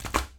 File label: Meat Slap